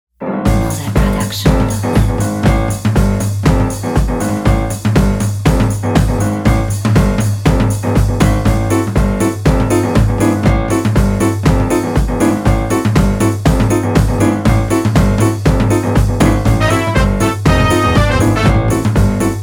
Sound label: Cheerful Jazz